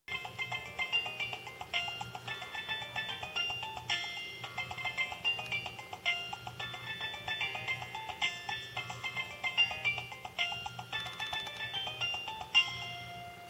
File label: nokia 2610 ringtone festival